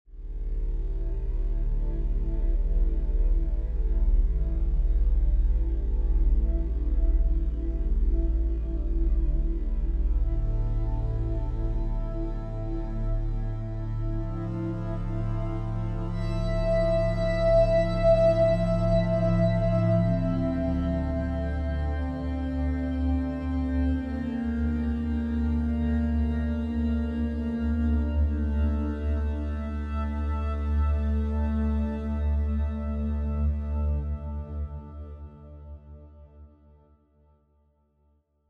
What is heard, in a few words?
Deep Bowed